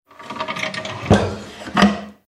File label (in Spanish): cerrar baul